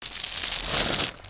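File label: sizzle; Total Chaos Nukem 3d Pack; duke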